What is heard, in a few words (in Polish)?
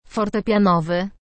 fortepianowy